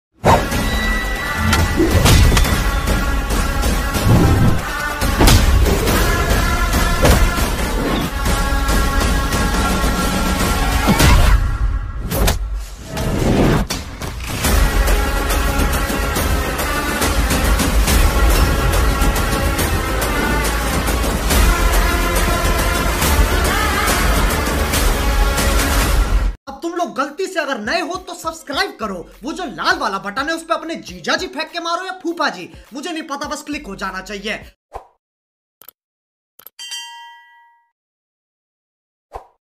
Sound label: background bgm; aadikeshava; panja vaisshnav tej; sreeleela